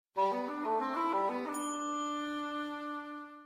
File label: Tune